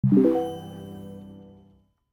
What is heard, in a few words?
Discord Unmute Sound - Christmas